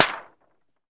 Target Hit Light Pap, target range

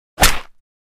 Bullet Impact Sweetener 4, Into Flesh.; Body

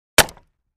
Axe Wood Crate Palett

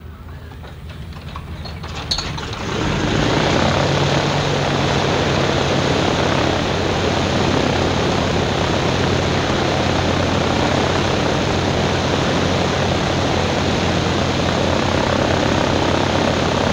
B29 Strt Idl Offset Id, b-29 superfortress; B-29 Start and Idle, Offset At Idle

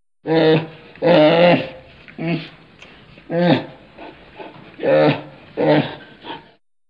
Bear Unknown Type

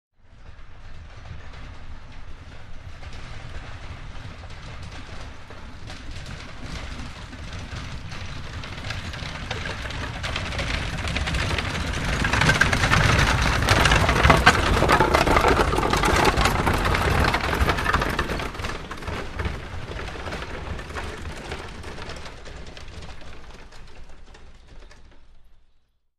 Carriage By Fast

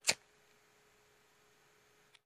Bic Lighter misfire; lighters & matches

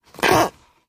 Lizard Tokay Gecko

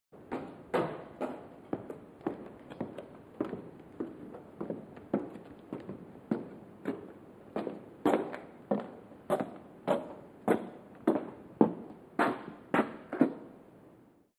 Footsteps W Scuffs Re, tile

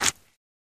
Grapefruit Being Sliced By A Knife

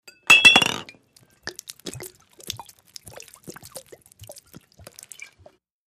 Beer Bottle Fall Over; eating & drinking

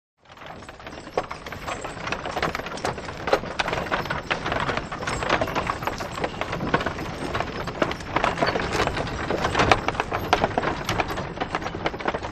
By Horse Cart Up Past A, carriages

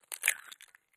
Breaking An Egg Open

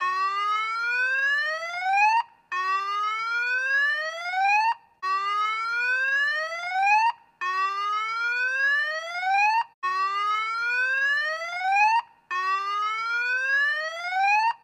Car Alarm; car alarms

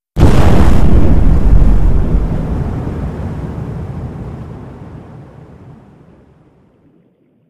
Underwater Explo, explosions

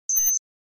Flash Beep HF Elect Bu, flash beep

